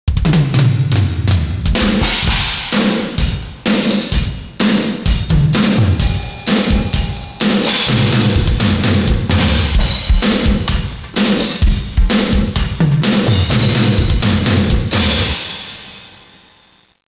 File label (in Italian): batteria